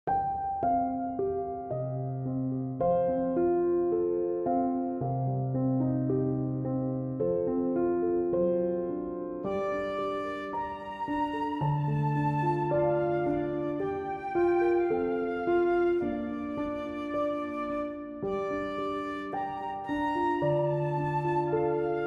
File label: INSTR; مجموعة عمانوئيل